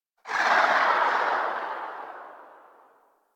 Pistol Tail; Weapons; Sapient; Warframe

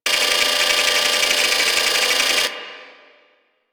Security Camera Loop; Ambience; Warframe; Grineer Fortress

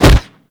Fighting Blocked Impact; Warframe; Weapons

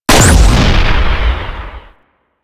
Antimatter Null Star Fire; Warframe; Warframes

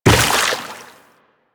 Run Water, Footsteps; DE; Warframe; Tenno

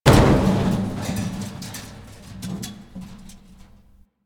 Destructible Metal Barrel; Warframe; Ambience; Nineteen Ninety Nine

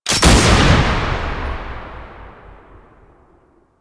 Assist Shot; Quincy; Warframe; Quests; Nineteen Ninety Nine

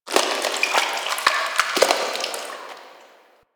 interior drip goop run; Ambience; Misc Indoor; DE; Warframe; Infested Eris

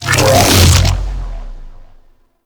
Portal Close; Enemies; Sentient Dolicholyst

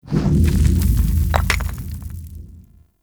Town Stone Debris, Ambience; Warframe; Duviri

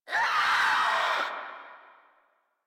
Infested Crawler Attack Short; Warframe

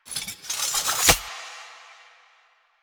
Idle Sword Twirl; Arthur Hub; Warframe; NineteenNinetyNine

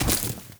Walk Vegetation, Footsteps; Characters; Enemy; Lady Deora

